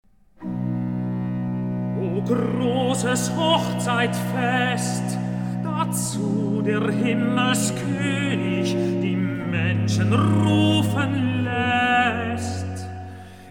Recitativo Tenore; BWV 162; O grosses Hochzeitfest

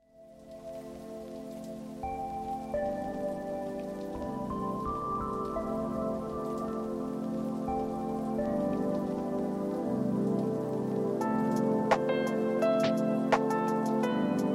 lofi instrumental

